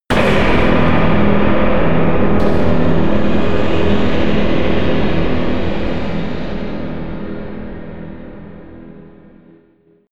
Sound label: crush hit